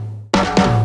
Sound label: Fills, Loops 140 BPM